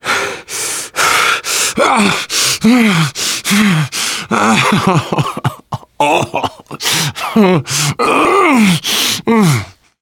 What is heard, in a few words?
exertion critical, survivor voice biker